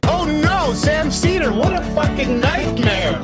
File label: singing; oh no sam seder